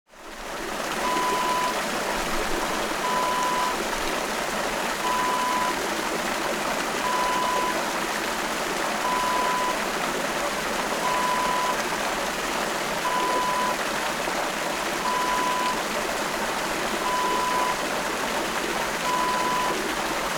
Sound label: water fountain